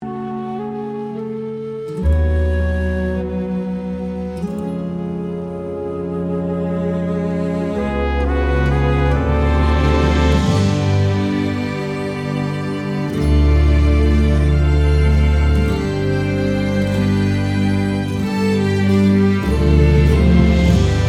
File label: Adult Female Backing